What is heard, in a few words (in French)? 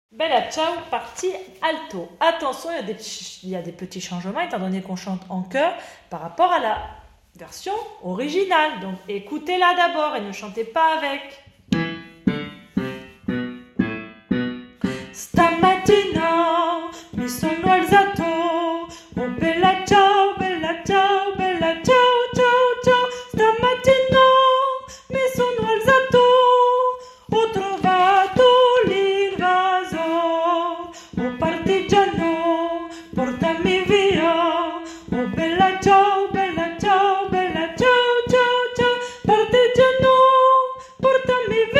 partie alto